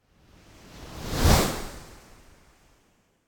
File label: Wave By Medium, Fast And Thin Wave - Passing L to R Wave Whoosh; Hollywood Edge; sound designer's toolkit v2